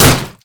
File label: basic attacks; Ziggs; poolparty